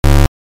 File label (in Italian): suoneria beep